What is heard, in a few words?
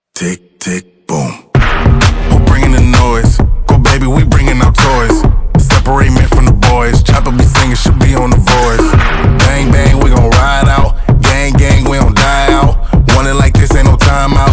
Tick Tick Boom